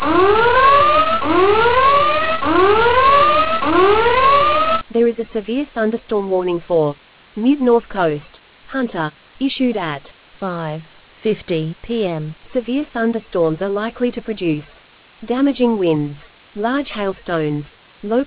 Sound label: storm audio